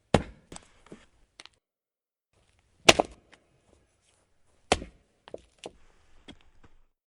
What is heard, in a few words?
medium chop tree; SFX; DailySounds